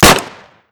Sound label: Fire; Weapon Package FortWars